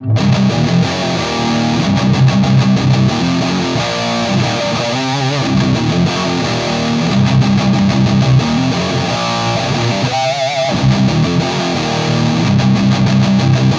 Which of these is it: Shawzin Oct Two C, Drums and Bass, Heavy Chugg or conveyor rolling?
Heavy Chugg